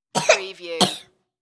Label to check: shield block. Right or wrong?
Wrong